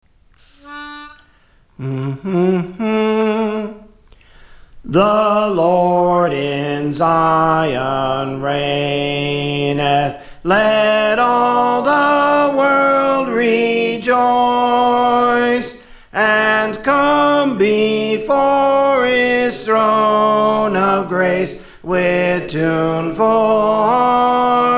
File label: Soprano; The Lord in Zion Reigneth; Praise For The Lord Training